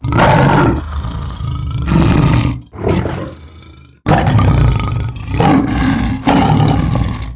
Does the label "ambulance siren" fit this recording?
No